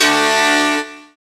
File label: SYN Q-GUI, dance synth; Sound & Vision - Gigapack I CD 2 (Roland)